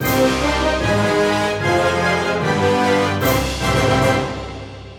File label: Battle Cleared; Mario + Rabbids Kingdom Battle